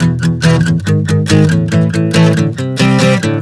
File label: E-G#-A-C 140 bpm, acoustic guitar chord progressions